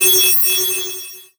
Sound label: Digital; Adobe; Multimedia Internet CD-Rom Flash